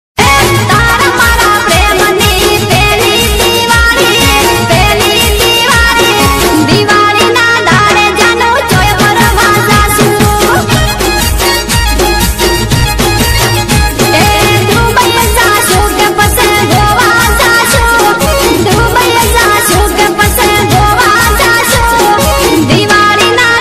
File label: Diwali Ringtone